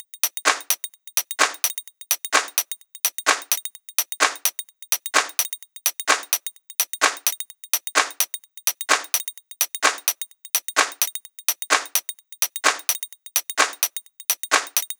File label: Techno Kit Drums; Mutekki Media; Vengeance Electroshock Vol.2